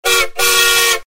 train whistle

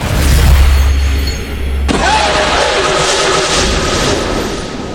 yell; zurv

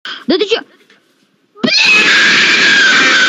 russian screaming; minecraft

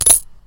coin

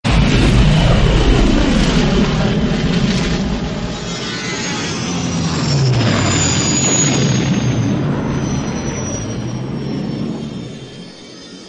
millenium falcon sound fx

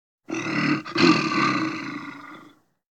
bear growls

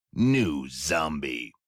zombie

